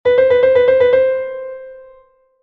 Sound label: battement; riemann 1882